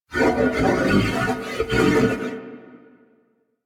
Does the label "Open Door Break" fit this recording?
No